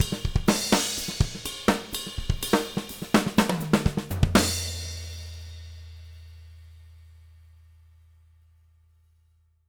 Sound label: 124 END, Living Drums